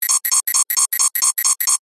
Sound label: science fiction console alarm